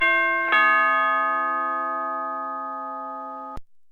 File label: Bell, Deurbellen